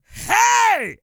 YELL, MALE HUMAN; Spectrasonics Vocal Planet

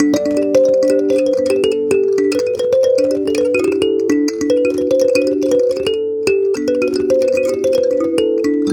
Kalimba, African

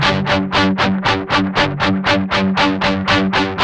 8TH G#3, Guitars; Spectrasonics; Hans Zimmer Guitars VOL-1; 128-JACKHAMR

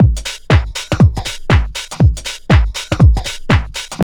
house loop

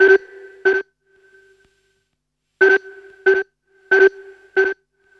SYNT; USB Soundscan vol.47; AKAI; Hip-Hop & R&B

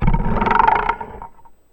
Box & Ruler, contact mic

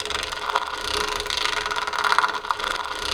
CHAIN DRAG; ENVIRN-AMB

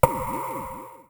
Snare, Korg Z1

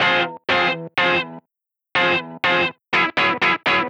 Guitar Stabs; Sonic Foundry; Rondo Brothers Trip-Hop Loops & Samples

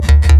PVC TUBE LOOP; Rarefaction - Poke in The Ear With A Sharp Stick

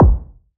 BD, BASS DRUMS; EdgeSounds

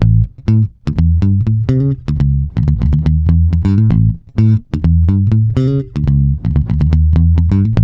124 BOOGALOO, Bass; Spectrasonics; Bass Legends

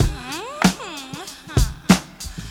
put your love middle, breaks

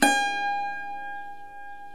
SAROD

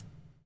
BUBL, Backbeat; Spectrasonics - Backbeat

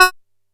F#5, Bass; E-MU Producer Series Vol. 6 – Analogia Project; Boom da Bass

